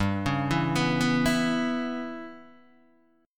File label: G 6th Flat 5th, Guitar, DADGAD